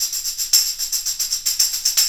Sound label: Tambourine